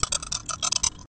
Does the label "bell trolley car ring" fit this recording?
No